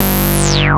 Industrial Analog Single Notes Short; Sonic Foundry (Sony Creative Software)

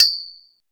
POLE PERC; TEK PERC2

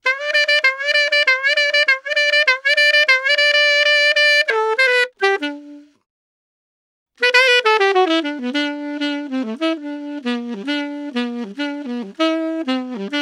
Solo Sax G Free; Quazibeat - African Secret; Saxaphone

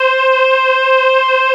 HOLLOW C4, STRING; Q Up Arts; Dream Experience

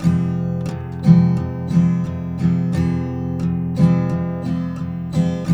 TK MIN F#2, Guitars; Spectrasonics; Hans Zimmer Guitars VOL-1

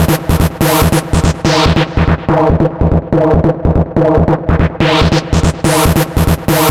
Stab 143 E; 90 sSampleCDs; Club Techno

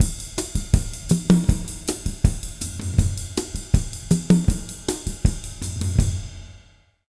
160, Jazz Latin Drumloops; USB Soundscan vol.08; AKAI

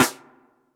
Snares, Elektron MachineDrum SPS1 MKII

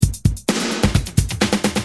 Tight, Drums Perc; Industrial

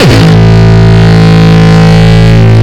Longkick, kicks

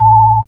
stabs; stabs & hits

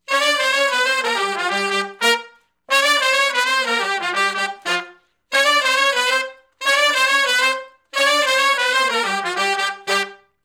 R B Riff (G) unison, Horncraft; Sections

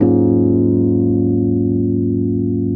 RHODES; Houseworx

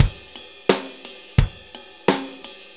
4-4 Loop, Drum Loops, Full Kit Loops

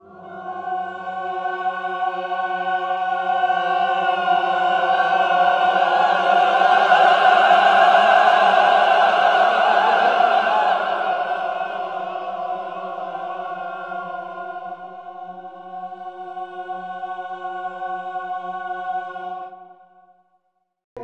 CHO VIBRA, CHO Vibrato; Sound & Vision; Gigapack I CD 2; CHO Vibr.+NoVoic

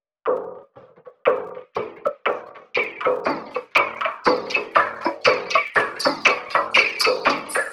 Frame Drum Loop, Ethnic Instruments; Sonic Foundry (Sony Creative Software); The Electronic Music Manuscript Collection

